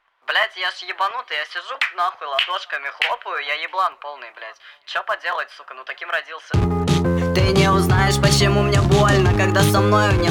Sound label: speed up